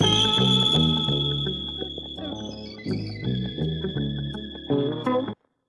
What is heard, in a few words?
guitar laid back